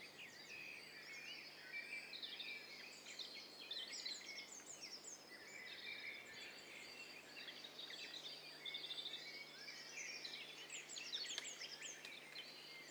STEREO BIRD COCKTAIL PARTY

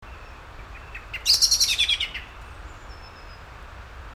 Turdus merula; SETE

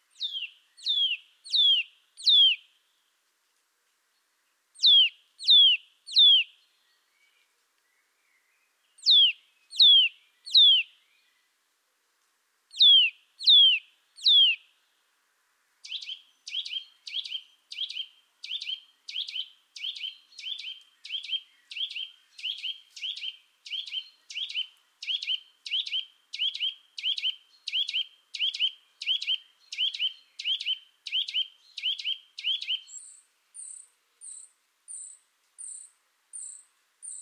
Sitta europaea; SETE

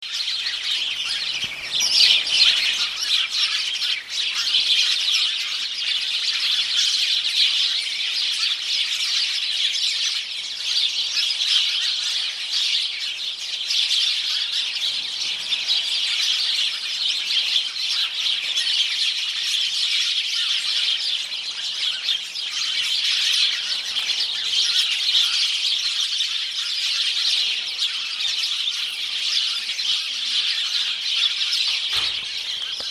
Passer domesticus; SETE

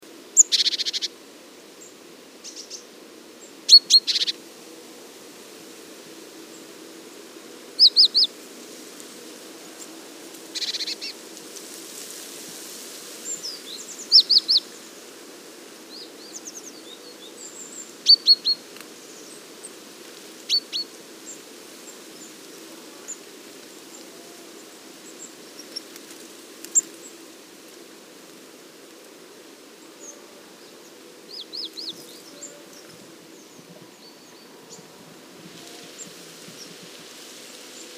Parus major; SETE

